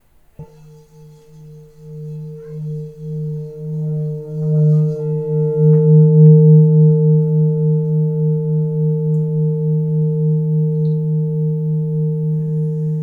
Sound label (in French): bol bengale 1.3-1.4kg Re diez